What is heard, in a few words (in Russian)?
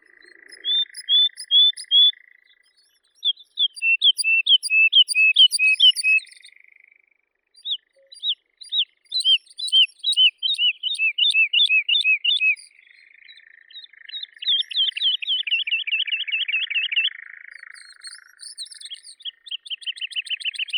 zhavoronok lesnoi lullula arborea, zvuki prirodi